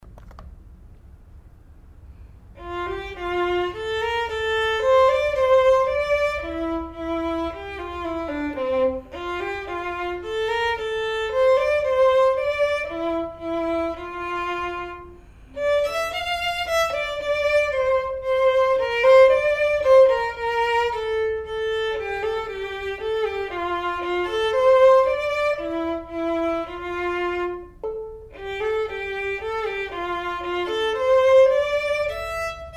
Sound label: perf vln